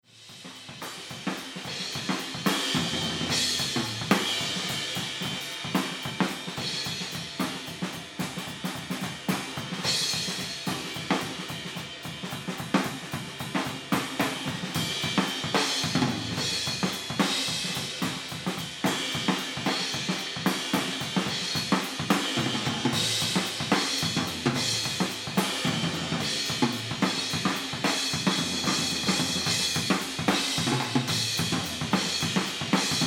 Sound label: Drums Room F6 On